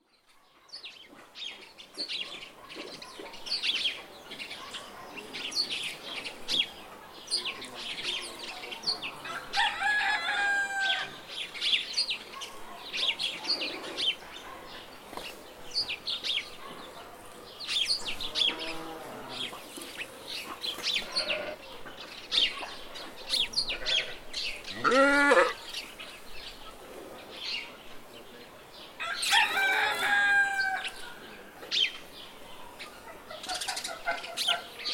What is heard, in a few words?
farm ambience